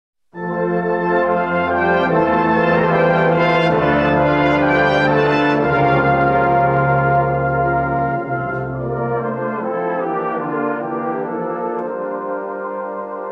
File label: Brown Bird Singing